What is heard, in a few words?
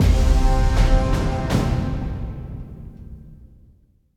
Win; Foregone; ios ps4 switch windows xbox one; Mus ForestBoss01 Win